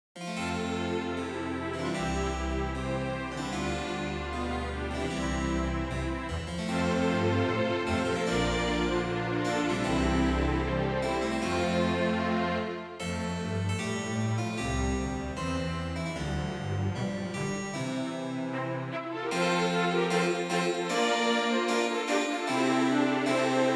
Air for strykekvintett med cembalo; Hertz

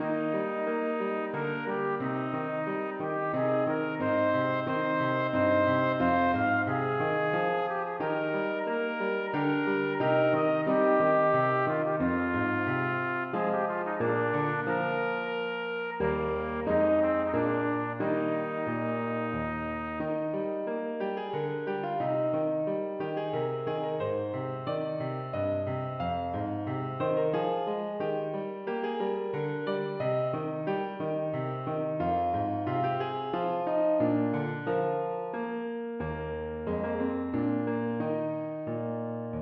Menue for trompet og piano; Bang

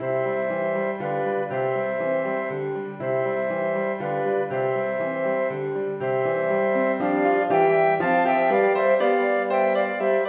Menue for to fløyter, horn og piano; Bang; Samspilltrening

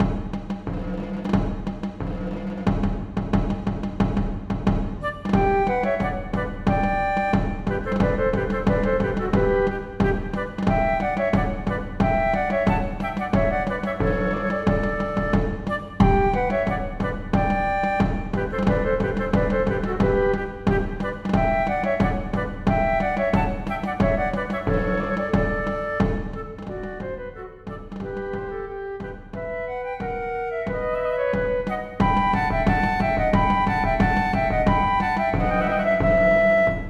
March for fløyter og trommer; Aamodt

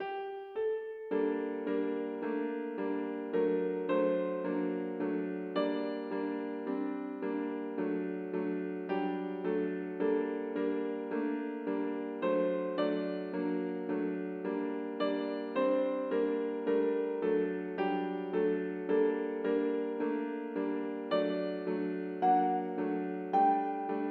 Aria for trompet og piano; Bang

